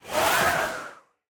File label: idle air, breeze; mob; minecraft